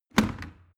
plastic water boiler place hard

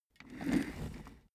office chair trundle slow short

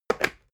water boiler put down short

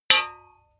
hammer hit metal dull

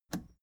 plastic button scratch smooth release enable

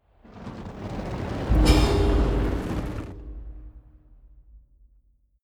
whoosh magic fire bowl

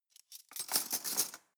cutlery teaspoons drawer place soft

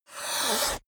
fishman hiss

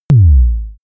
sub kick short

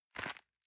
envelope grab medium

